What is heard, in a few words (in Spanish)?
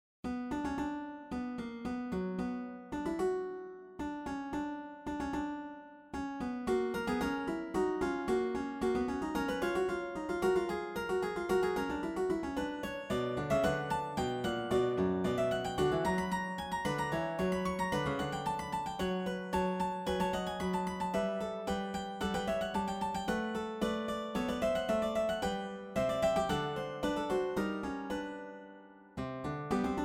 FUGA EN SI MENOR